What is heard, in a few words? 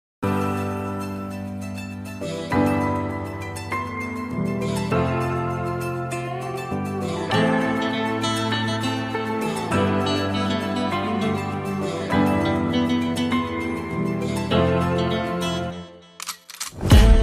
instrumental